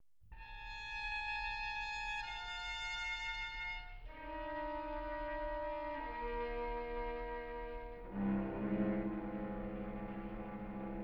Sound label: Quartet A Minor, String Quartets; Béla Bartók